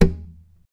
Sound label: TAP, FLAMENCO Guitars; Spectrasonics; Hans Zimmer Guitars VOL-2